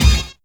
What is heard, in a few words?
dance stab; E-MU Formula 4000 Series Vol. 2; Dance Hits